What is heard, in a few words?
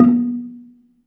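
Balafon B2, Asian Traditions; Soundscan vol.60; AKAI; BELAFON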